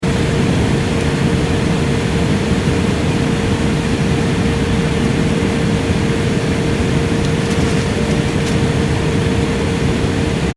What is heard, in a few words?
transportation aircraft commercial airbus a340 interior, In-Flight Ambience; Airplanes; Vehicles; McKinney Sound